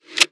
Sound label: Percussion